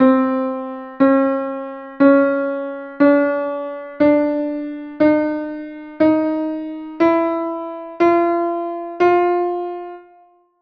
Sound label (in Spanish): Clausura modular